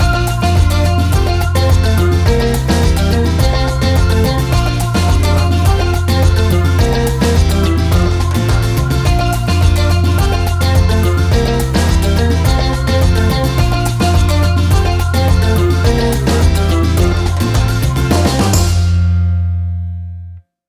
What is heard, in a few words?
Bouncy Reggae Island Music